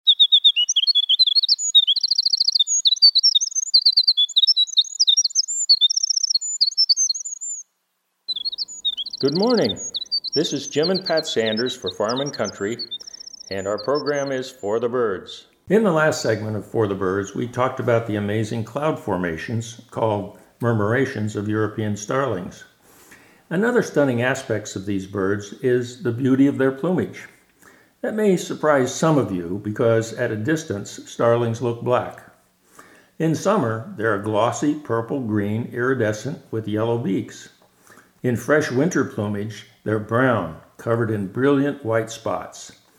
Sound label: European Starling; for the birds